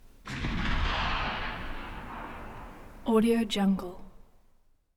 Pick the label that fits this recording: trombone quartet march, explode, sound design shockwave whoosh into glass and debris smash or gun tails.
gun tails